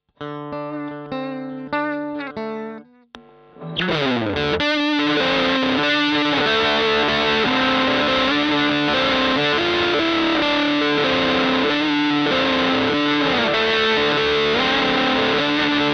Gain max Some Riffs; BM20 ULTRA

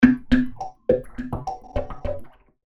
Drip Rhythm

